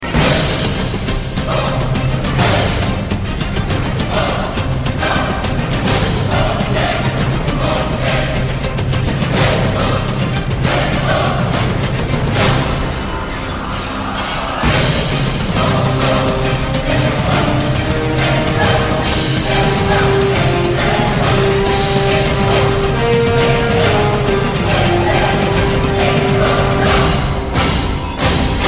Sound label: scary tone; twilight